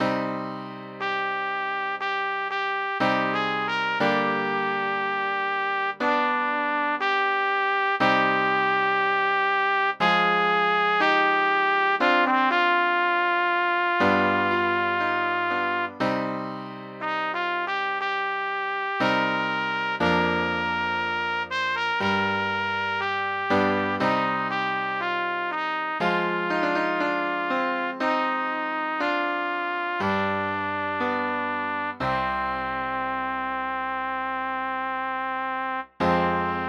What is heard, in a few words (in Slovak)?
pre trúbku a klavír; Tiché roprávanie